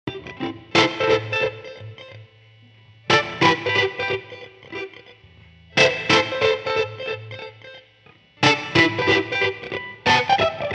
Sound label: Lead Guitar; Sage Arts Records